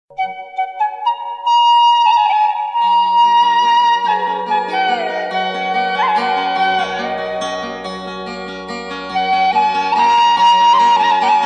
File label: love flute